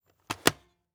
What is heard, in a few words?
polaroid camera close; mechanical